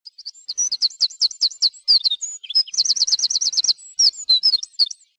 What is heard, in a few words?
Wren Wint